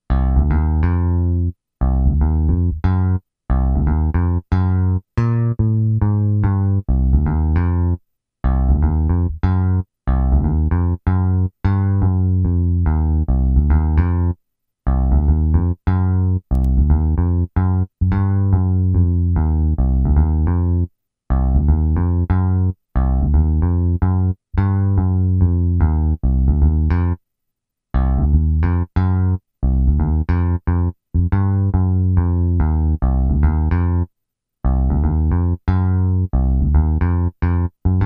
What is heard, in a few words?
Hollywood Bass line